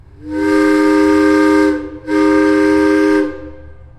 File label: Hancock 4700 Whistle